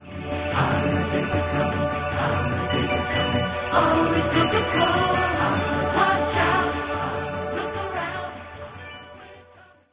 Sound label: ringer; Ringtones